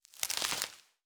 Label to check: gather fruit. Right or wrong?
Right